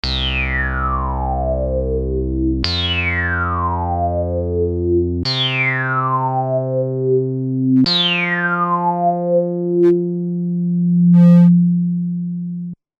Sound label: JP 08 Filter Sw; Roland Boutique Jupiter JP-08 Synthesizer